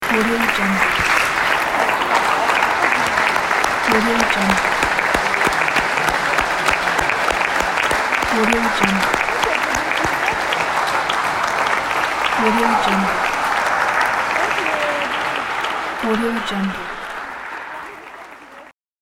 great claps

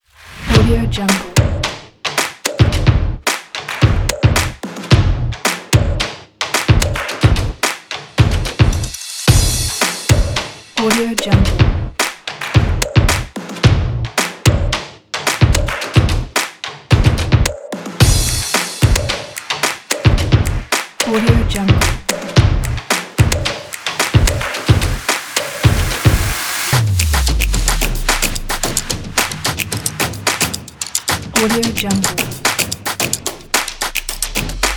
stomp kit

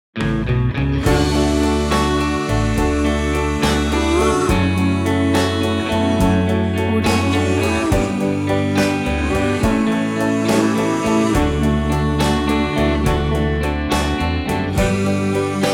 doowop podcast; applehillstudios

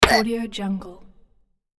character gets whacked

